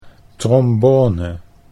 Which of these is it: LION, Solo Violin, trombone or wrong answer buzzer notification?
trombone